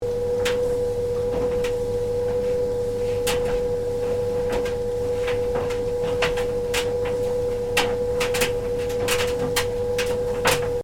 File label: Tumble dryer (domestic): running, loop; Laundry Sound Effects; Laundry (Sound Effects:Household); Stuart Duffield